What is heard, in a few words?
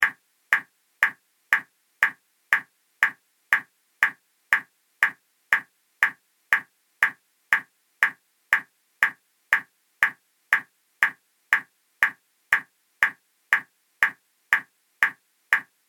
Timer Loop, alarm; electronic; Loopable